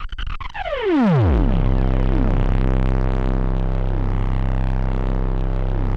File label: SPACE STRINGS, Yamaha VP-1; Music Store